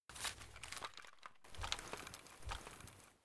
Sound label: mp153 inspect; Boomsticks and Sharpsticks; eft wp